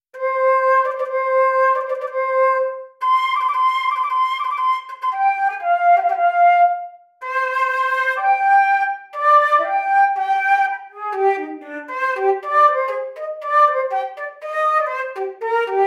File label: flute; diamond